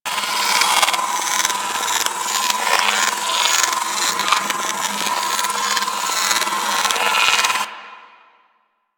Trooper Spark Loop; Warframe; DE; Sentients

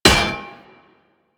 Melee Hammer Impact Generic; Warframe; Weapons; Hammers

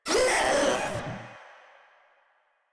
Runner Pain; Enemies; Warframe; Proto Infested

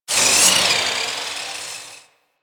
Saw Launcher Fire Projectile; Warframe; Saw Blade Launcher

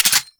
Glass Shotgun Mech; Warframe; Tenno; Weapons

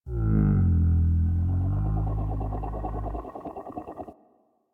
Landscape Mushroom Creak, Ambience; Warframe; Venus Open World; One Offs

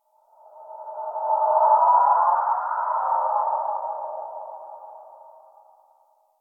Eerie Howl Cry; Ambience; Misc Outdoor; Warframe; Infested Eris

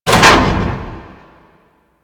Pod Lift Start; Elevators; Grineer; Canno Pod

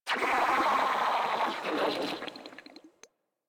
Frogman Death, Vocals; Warframe; Grineer

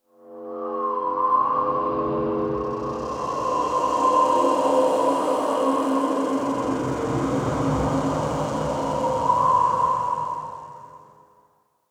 Railjack exterior dark ghost swirl; Ambience; Warframe

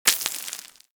Bullet Impact Debris Dirt; Warframe; Weapons

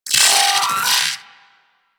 Arachnoid Micro Vocal Death; Warframe; Corpus

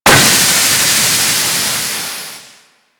Fortress Steam Hazard

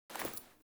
Foley Walk, Clothing; Characters; Player

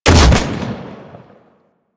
Fire Shot; Weapons; Seer; Warframe

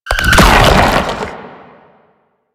Octopede Horrors Charge; Warframe; Man In The Wall

